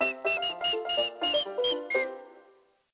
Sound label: Steamboat Whistle; Where's My Mickey